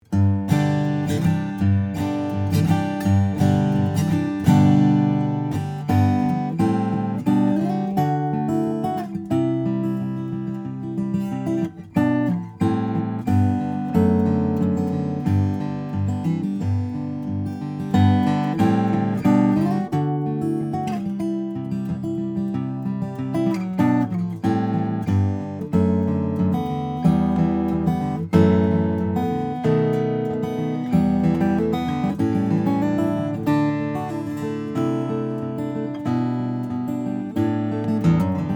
RainSong CO-WS1000N2, steel string guitars flattop; carbon fiber